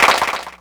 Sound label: Clapbox Pitch; Ableton Foundation FreePacks